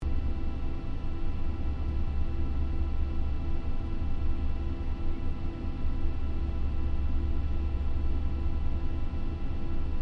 AIRCRAFT LOCKHEED L-1049 SUPER CONSELLATION IDLE INTERIOR; Connie, Super Constellation; 1955; Airplanes